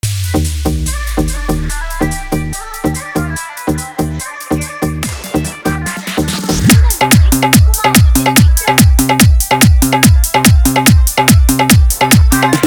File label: Bhojpuri Dj Song Dhollki Bass Remix